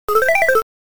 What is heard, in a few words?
Coin Inserted; Dig Dug II; arcade